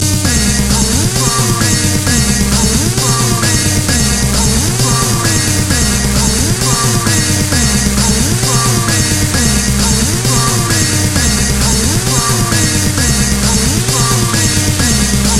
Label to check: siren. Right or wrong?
Wrong